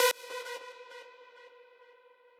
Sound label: lead